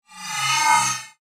Alien Robot Vocal, Scifi, Alien, Robot, Vocalization, Science, Fiction; Aliens